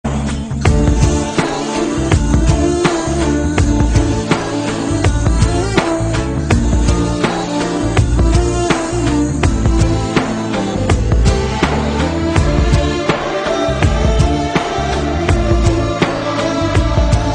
love failure music

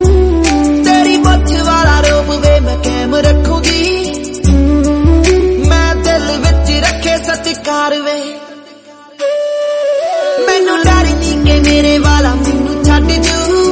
punjabi song

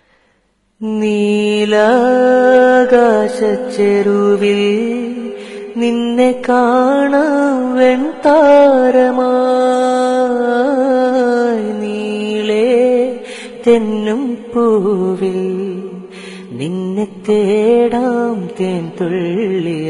beatiful ring tone

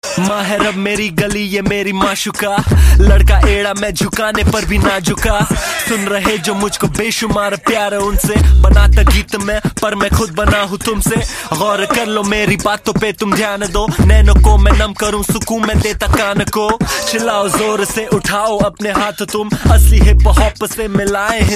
hip hop song ringtone

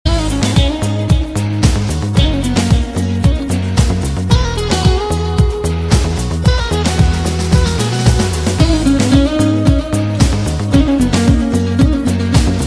instrumental; jannat

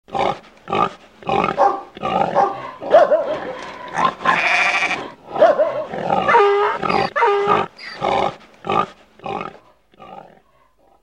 noisy farm

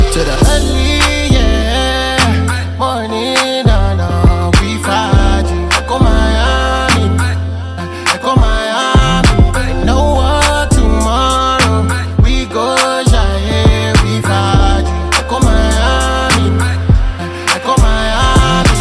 ringtone

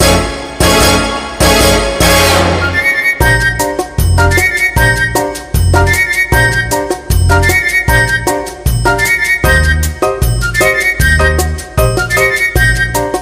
theme ringtone; austin powers

